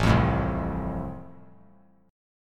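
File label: A Minor Sharp 5th, chord strum; Am#5 strummed; 9,12,12,10; JGuitar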